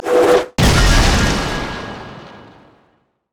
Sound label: tidal wave; nami koi; LoL